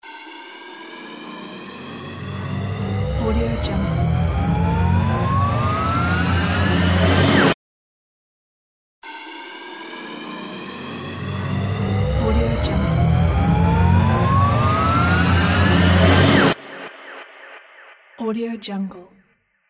rise effect; rolstudio

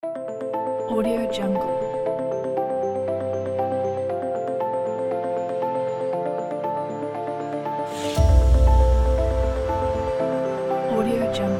epic scifi

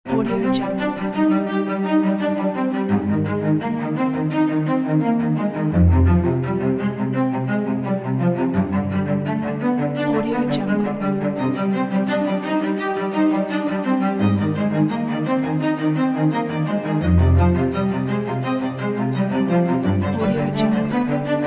inspiring epic cellos

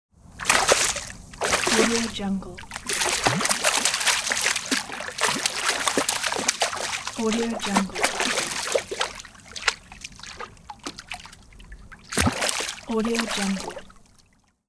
fish splashing

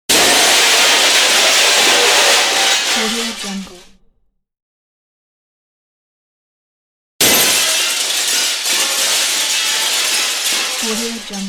glass breaking impact sound; fx pack; wistansound

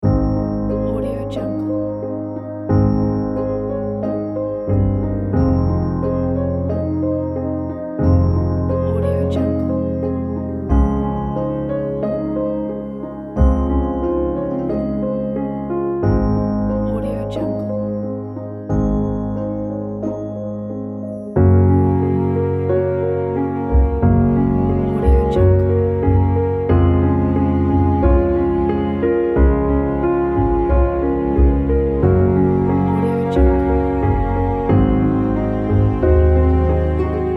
light piano background

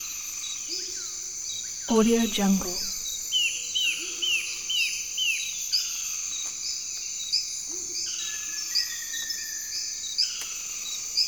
tropical forest morning

